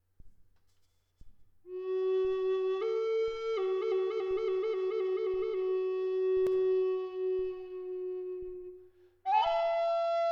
Astflöte Ahorn G 432 Hz; Crysokoll; Feder

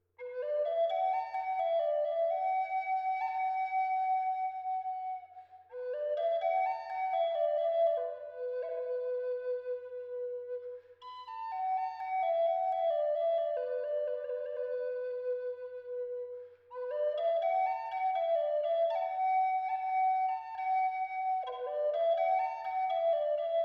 Walnuß C hoch 432 Hz

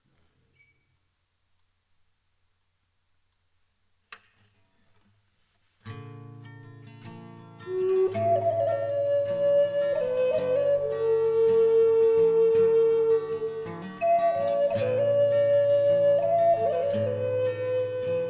Olive G 432Hz Gitarre

